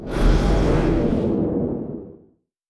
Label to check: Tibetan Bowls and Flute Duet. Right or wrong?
Wrong